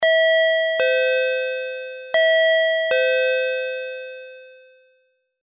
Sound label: bell